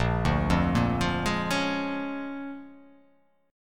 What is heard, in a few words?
A sharp 7th Sharp 9th A sharp down